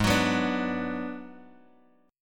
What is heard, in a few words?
G sharp Minor 7th Flat 5th G sharp down Guitar Open D